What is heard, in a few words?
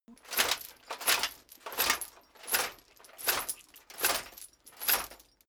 metal chain movement on plate sequence